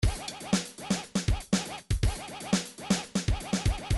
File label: house 2bar